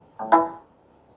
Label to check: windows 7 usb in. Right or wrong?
Right